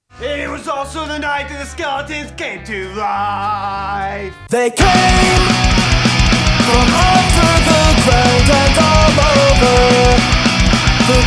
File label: spooky